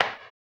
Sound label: HAMBONE, Vocal Blues Soul